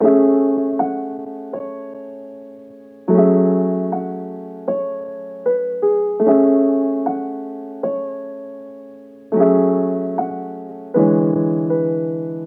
Piano Dry 77 BPM F# Min; Melodics; Cymatics - Eternity Sample Pack

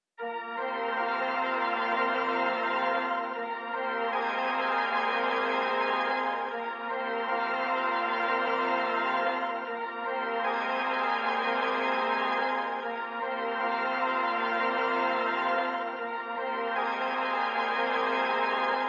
152 BPM E Min Pad; Trap; Melody Loops; Loop Stems; Cymatics; Nightmares Trap Melody Loops; Firing Squad

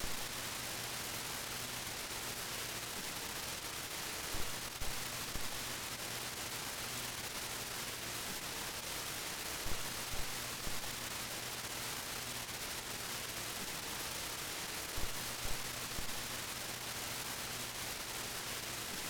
Tape Broken Noise; Lofi

